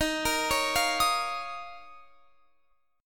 D sharp 7th Suspended 2nd, Guitar, Open F; D#7sus2; arpeggiated

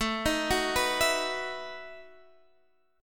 E Major 7th Suspended 2nd Suspended 4th

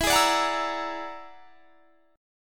E 9th Flat 5th E down; E9b5 strummed

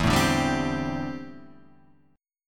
D 7th Suspended 2nd Suspended 4th E

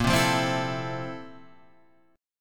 F Major 7th Bb, chord; Fmaj7/Bb strummed; JGuitar

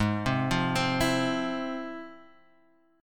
F Minor Major 7th, chord

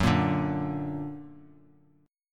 Gb Augmented, Bass Standard; x,9,8,7; Gb+ strummed

